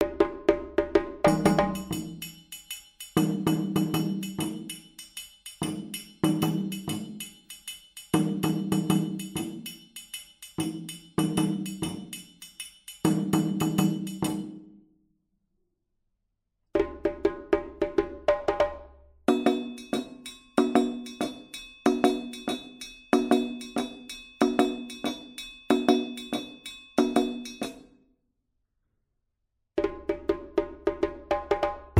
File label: Fankani Dununs, djembe